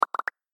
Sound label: chat